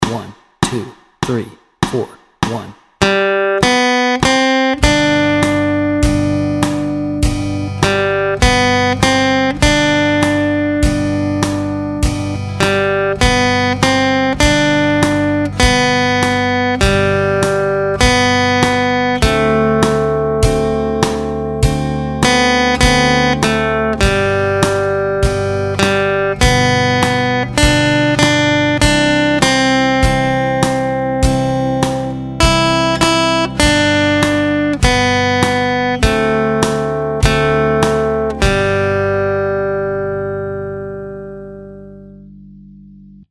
When The Saints Go Marching In Melody and Chords Track, guitar